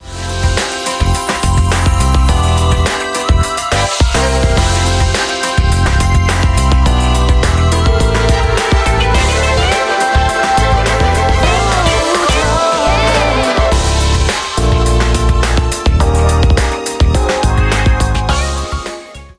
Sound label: BGM xfade; Invaxion